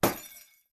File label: Bulb smash; Phasmophobia